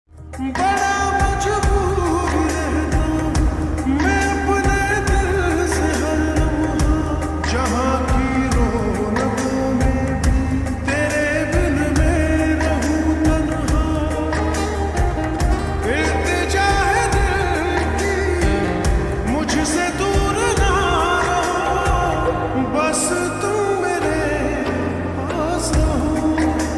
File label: Ringtone Slowed Reverb